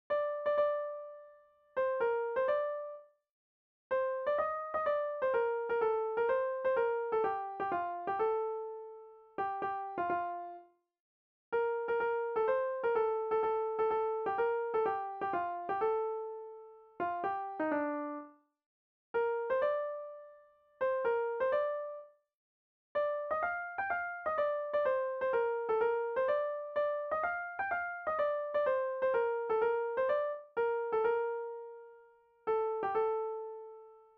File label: melody